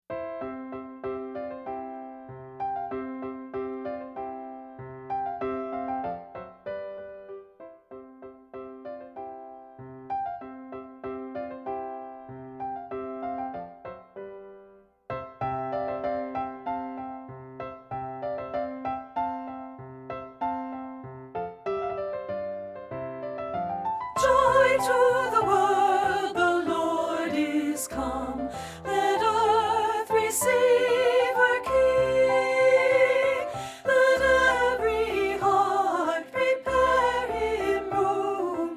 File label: SOP1